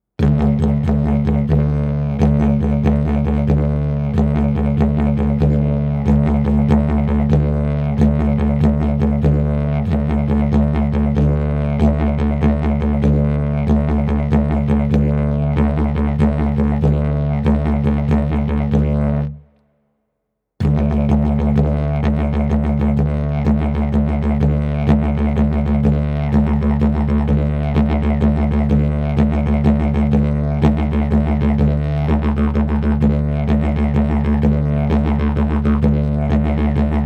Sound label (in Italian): ritmo livello principiante